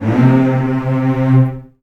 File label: Virtuoso Strings, L; ILIO; Kirk Hunter Virtuoso Strings VOL-2; 90 s Sample CDs